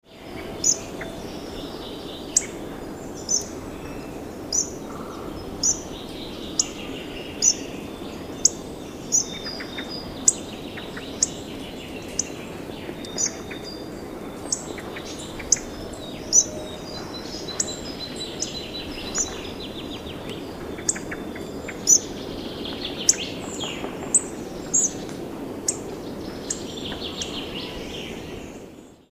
Stenknack

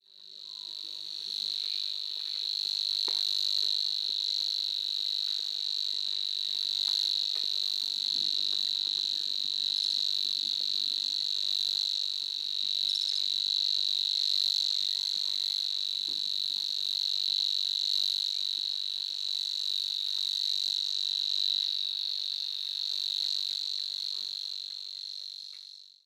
Vassangare

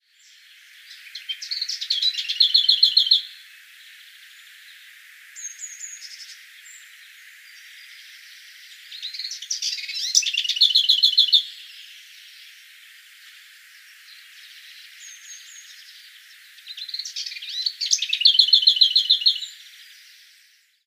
Artsangare

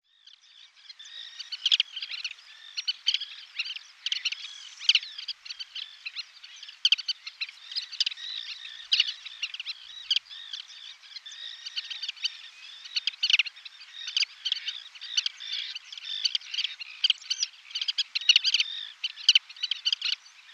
Svartvingad vadarsvala

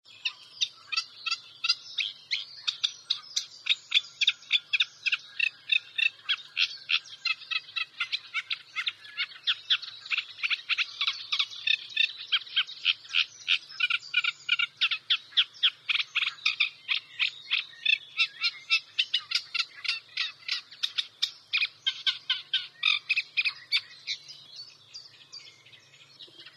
Rorsangare

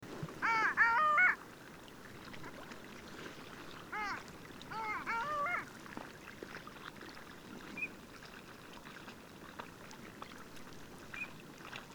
Alfågel

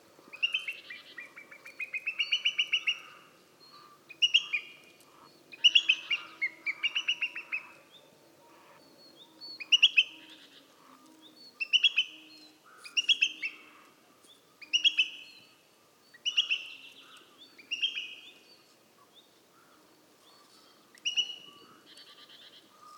dvargorn

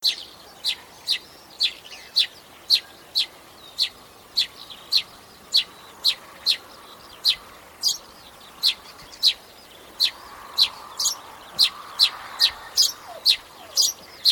Pilfink

